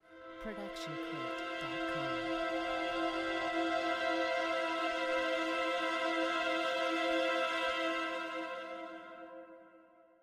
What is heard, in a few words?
Mystery Piano; soundscrate